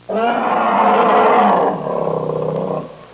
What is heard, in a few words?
Grizzly bear pain roar